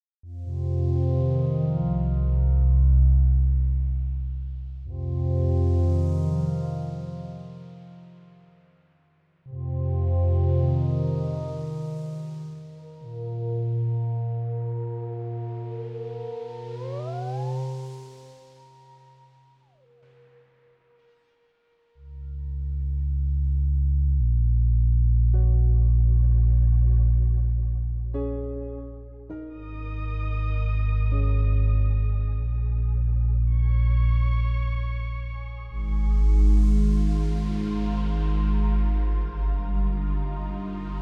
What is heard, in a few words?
Rainforest